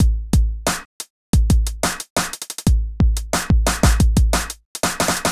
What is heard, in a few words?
ELECTRO HIP-HOP; Bass; Sonic Foundry (Sony Creative Software); Not Just Another Pretty Bass